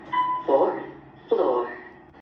Otis lift 4th floor

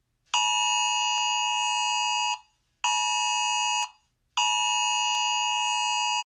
iphone eas alarm

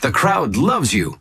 the crowd loves you

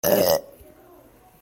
burp

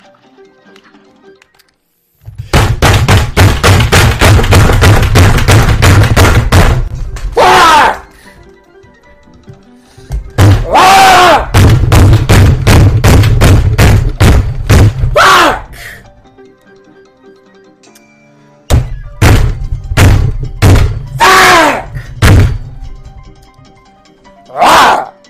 rawr

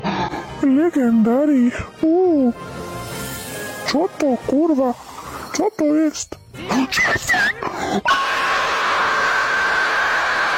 ooh

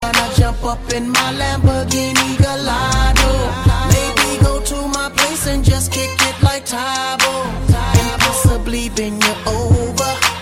ninja alert; glasgowgaming

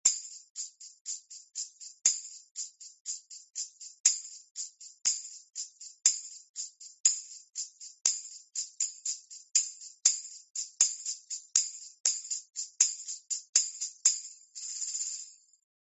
tambourine

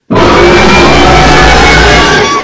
screamer; specter

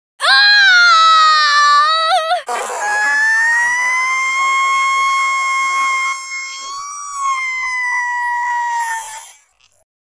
female scream

